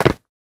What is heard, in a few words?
concrete land; Golden Crayon Sounds